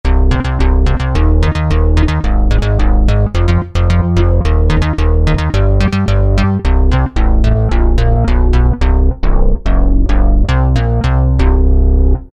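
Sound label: bass Syn, Yamaha TG500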